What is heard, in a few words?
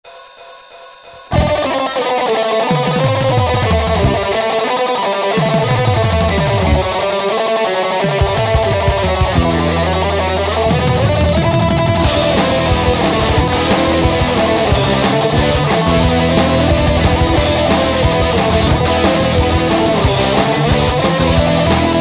Trap